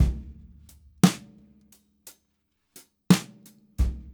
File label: Brushes SN HH